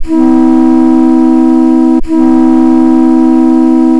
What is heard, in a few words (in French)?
tierces flute